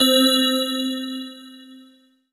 Yamaha TG500 SC Bell C4

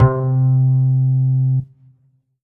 Korg NS5R Acoustic Bs C3

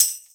Tambourine

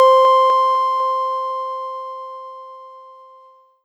M-Audio Venom Ultra Bell C3

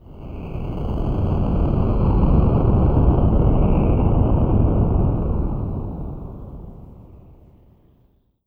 White Noise; Korg N1R

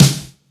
Snare Drum; Korg NS5R

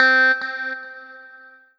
Yamaha TG500 GT Strt C4

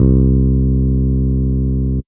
Yamaha EX5 Fingered C2

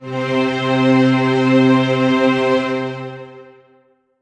Roland JV-2080 Film Octaves C3

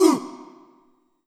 Yamaha SY22 Ooh

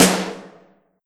Yamaha RM50 Snare Drum

